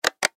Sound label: Button Switch